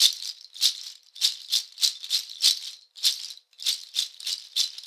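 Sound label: shaker, Synclavier Percussion, World & Orchestral; ILIO; Synclavier Percussion 2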